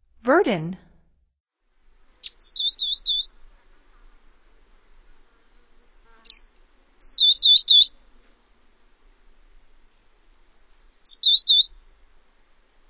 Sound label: Verdin, Songs of Birds of North America; Stokes